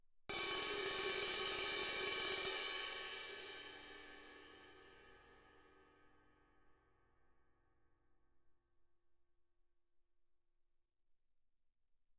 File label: 18 crash, stick, roll, suspended cymbals; Percussion